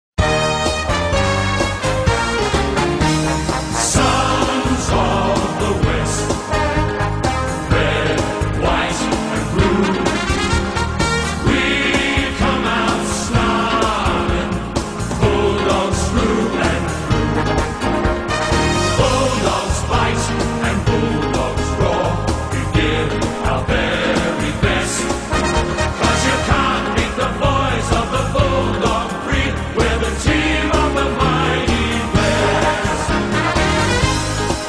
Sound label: Western Bulldogs Song